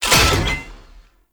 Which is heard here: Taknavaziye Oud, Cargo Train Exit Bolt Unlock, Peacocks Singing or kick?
Cargo Train Exit Bolt Unlock